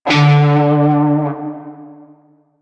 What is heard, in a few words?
Shawzin Flourish Oct Two D; Music; Warframe; Narmer Shawzin